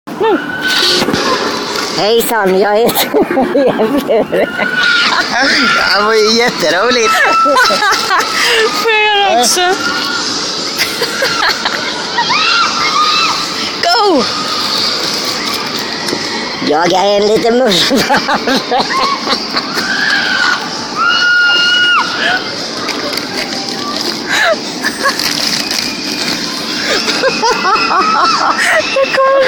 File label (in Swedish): helium; jag r en liten mullvad